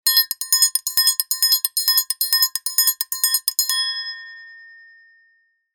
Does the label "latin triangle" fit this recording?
Yes